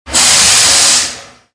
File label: brake